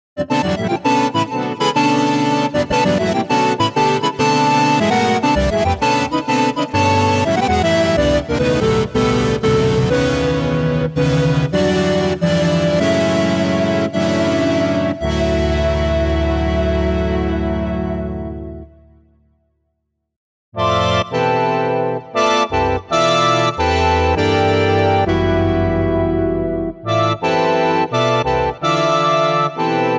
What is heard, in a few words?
synth; massivseries